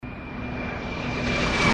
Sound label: Jet loops; English Prep Package; RexDoesTricks